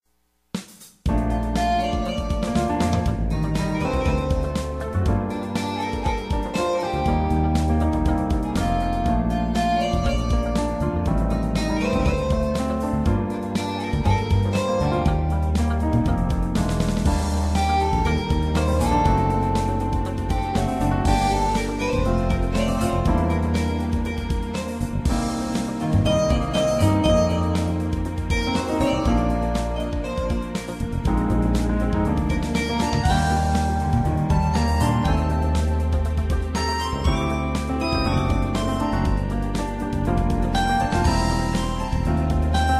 Tempo at 120 bpm

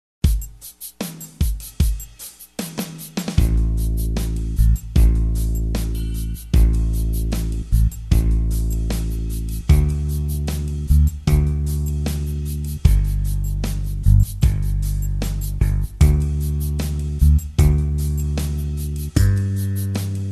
pop drums bass

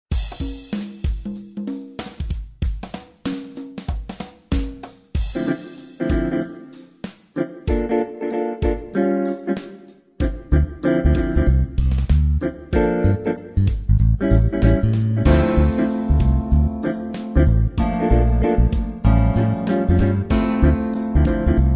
jazz tutti stereo

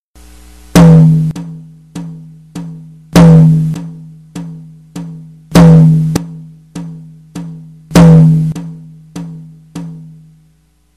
rhythm beats in 3 pattern

